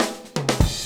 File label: FILL